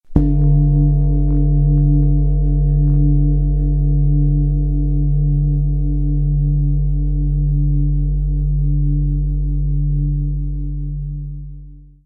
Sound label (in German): Klangschale; Mondkulmination; Mars; Liefeld